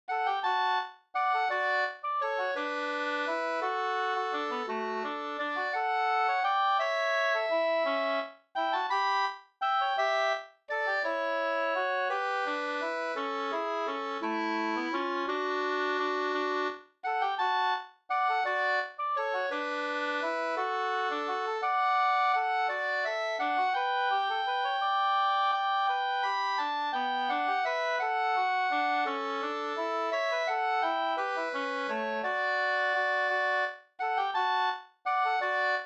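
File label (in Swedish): vals, two-part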